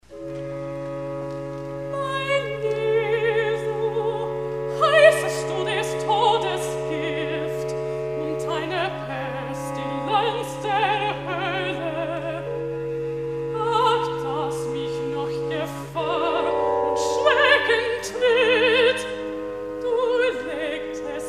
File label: Alto